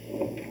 pinball move

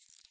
Pop-up Window

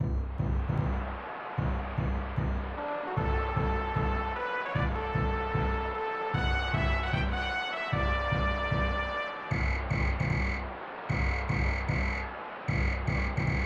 Baseball

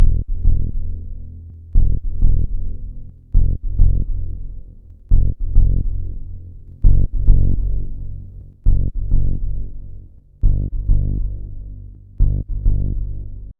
Ditty

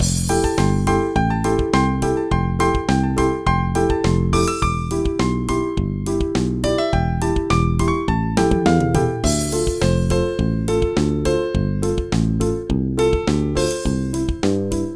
BGM Menu; Junior Brain Trainer; NDS

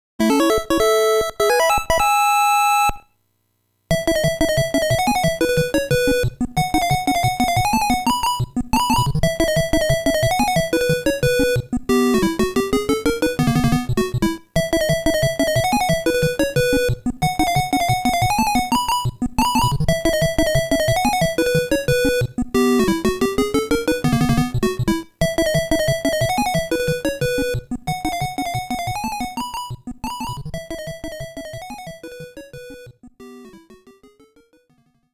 Fanfare ~ Race BGM; Game Sound Museum Namcot; Family Series

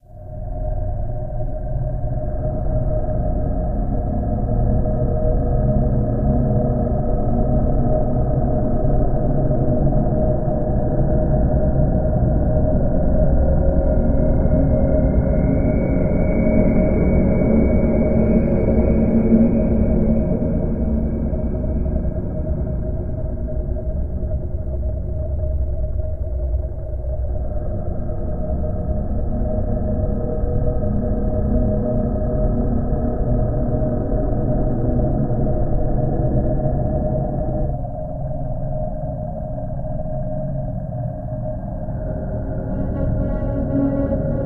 Ambient Scene; Tarr Chronicles Sign of Ghosts

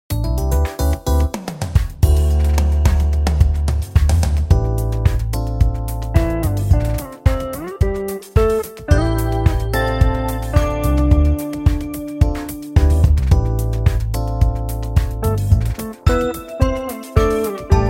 Fail; crazy strike bowling ex soundtrack

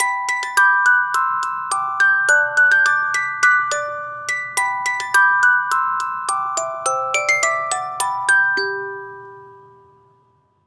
Music Box; Assassin's Creed Syndicate

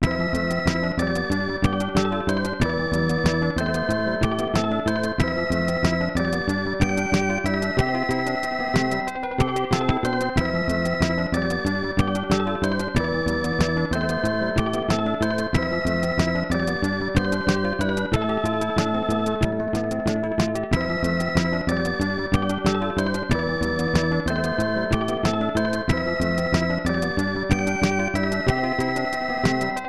Congratulations!; Okamoto Ayako to Match Play Golf; SNES

